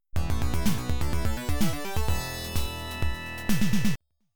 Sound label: Multiball Over